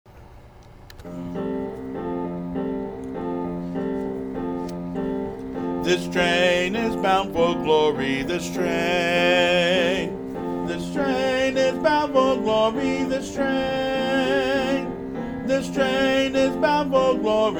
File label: this train bass, choir